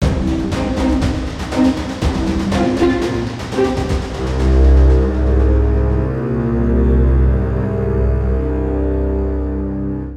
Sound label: Intense Stinger